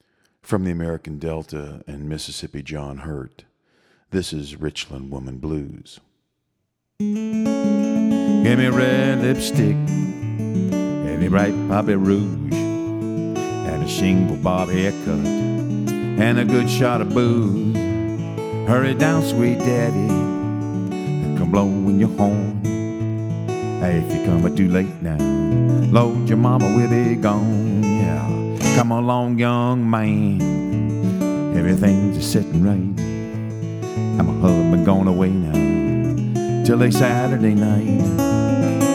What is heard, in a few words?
Blues